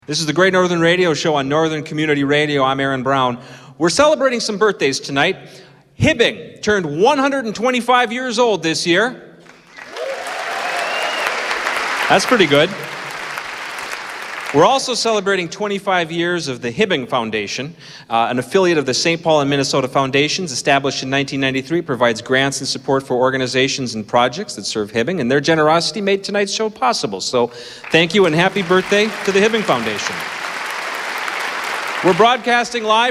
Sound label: Hot Jazz; Time Machine